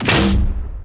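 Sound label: BAZOOKA; reaperman; giants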